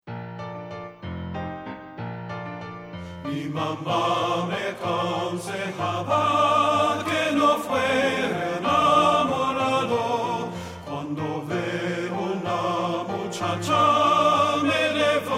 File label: TENOR; Mi Mama me aconsejaba